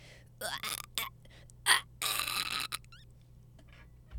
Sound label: Choking